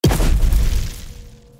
dark fire

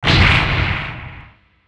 diamond dust

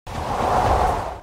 soul slash

